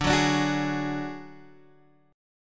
Gb Suspended 2nd Sharp 5th

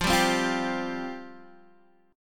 F Suspended 2nd, Guitar Open D; x,8,5,6,8,5

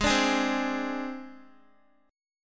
Ab Major Flat 5th Ab, Guitar; strummed; x,11,12,13,12,x; 4E,4A,5D,5G,5C,6F; AbMb5; JGuitar

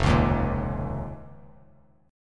F 7th Suspended 4th Sharp 5th F down; F7sus4#5; strummed; JGuitar

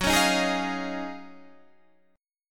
D sharp Minor 11th F sharp, chord

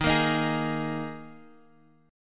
D sharp Suspended 4th, Guitar, Half Step Down

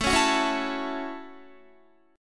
A 6th, Guitar, chord; A6 strummed; 17,16,16,18,16,16

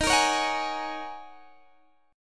D sharp Minor 11th D sharp Guitar Open D, chord

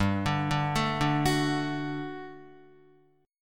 G Major 7th Suspended 2nd, Guitar; arpeggiated; 4F,4A,5C,5G,5C,6E

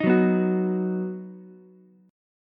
Eb Minor Sharp 5th, Ukulele; Ebm#5 strummed; JGuitar

